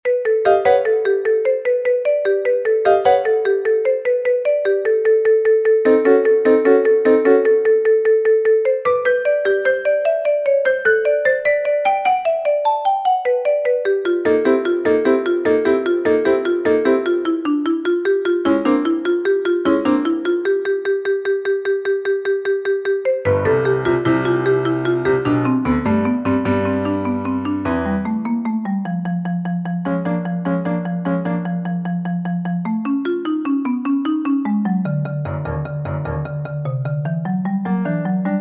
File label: for marimba piano; satie erik; celle qui parle trop